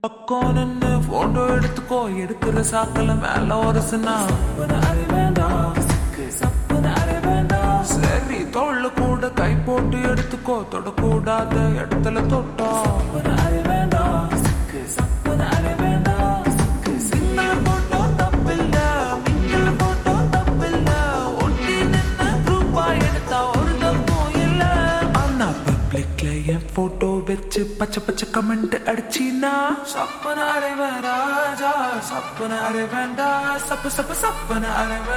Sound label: Ringtone Slowed Reverb; pushpa 2; allu arjun